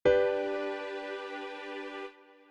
G 2nd Inversion

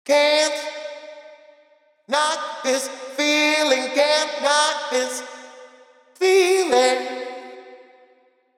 Send Reverb

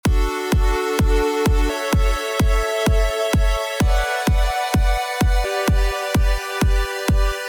Chord Rhythm

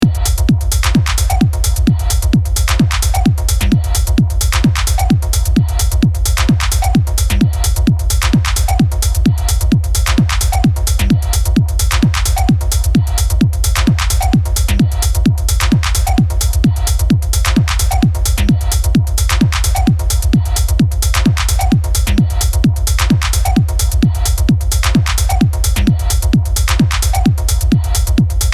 Drums Mixed

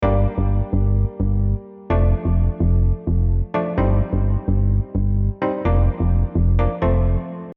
Chords Simple Bassline

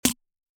Cabbage Snare

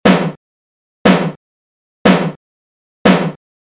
EMU Snare No EQ

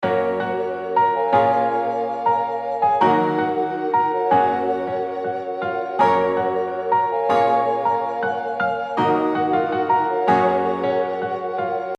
Type Beat Melody Example

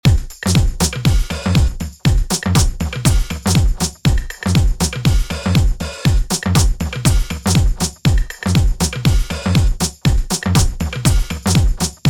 DRUMS UN SATURATED

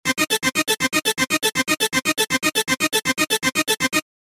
Frozen Reverb tail Arpeggio

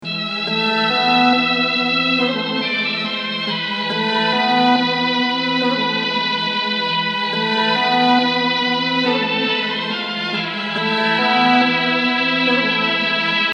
Melody Example

